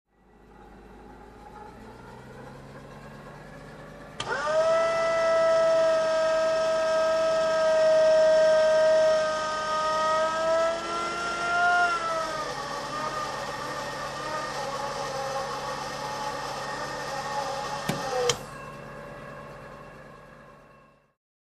Pulley Machine

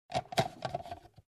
Plastic container opened

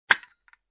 Bold Switch click

